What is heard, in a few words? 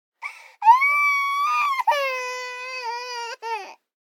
BABIES GROUCH Girl 12 months Distressed; Baby Boom